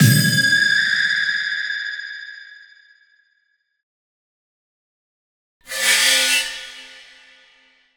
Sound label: Ice Spell Mobility Teleportation Flash Freeze; Mobility & Teleportations; Arctic Aura